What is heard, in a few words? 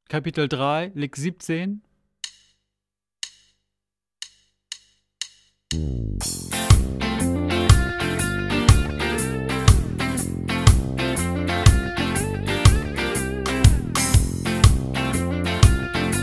Latin Style Guitar